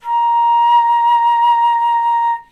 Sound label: vibrato flute; flute-synthesis